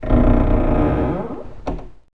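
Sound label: battle creak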